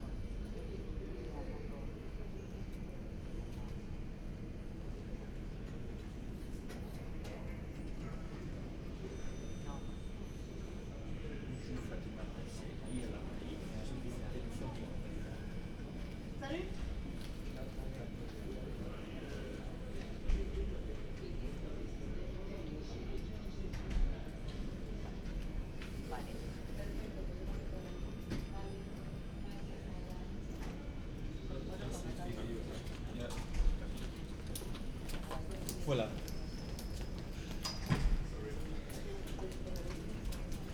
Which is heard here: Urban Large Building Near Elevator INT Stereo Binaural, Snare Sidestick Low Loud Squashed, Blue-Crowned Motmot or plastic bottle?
Urban Large Building Near Elevator INT Stereo Binaural